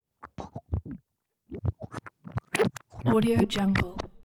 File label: baby vocal buzz; prosoundfx